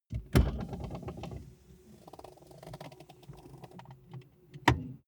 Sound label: car bmw e46 interior seat push back flutter